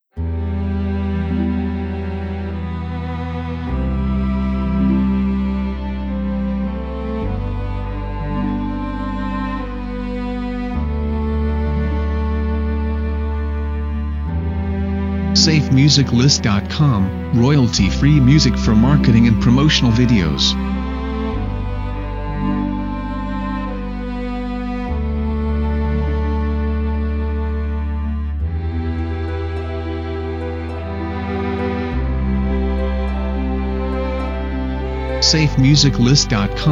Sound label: Ancient Forest; SafeMusicList